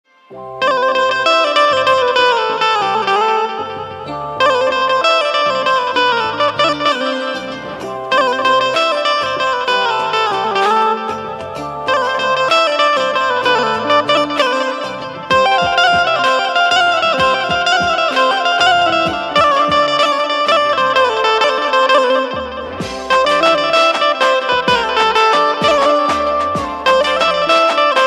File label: banjo ringtone, music ringtone; benjo music ringtone